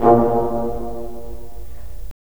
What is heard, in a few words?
BRS, Roland LA Composer